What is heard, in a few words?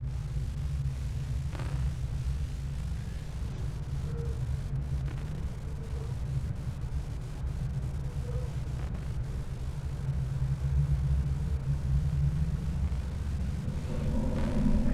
Abstract Ambiences Mix; SoundBits